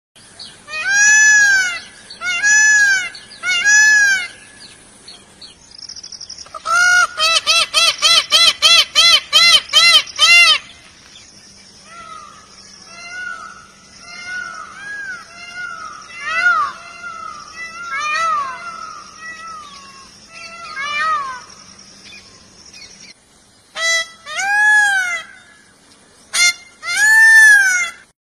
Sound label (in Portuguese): canto do pavao indiano